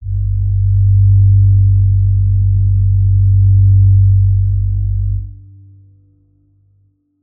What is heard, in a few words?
Crystal G2 f; HSS Ableton Pack1